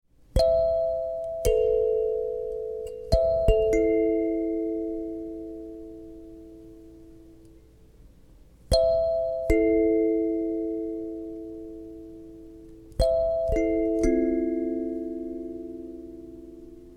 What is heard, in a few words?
Hokema B9; Hokema Double